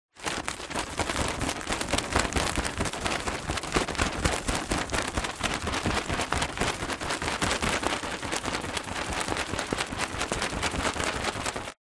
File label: leisure kite nylon small shaking